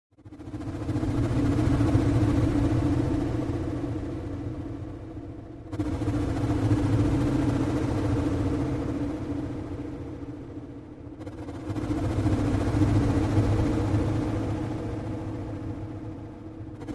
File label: Cosmic Radio Waves